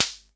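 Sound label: SR95 snare drum; Best Service; Dance Mega Drums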